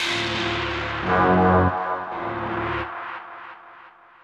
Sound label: Revolver Stab, Musical FX; Zero-G Smoke